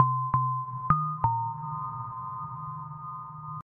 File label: Sweet Text Tone